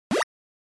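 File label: Game Boy General Pitch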